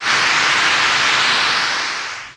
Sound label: Door High Tech Close, pneumatic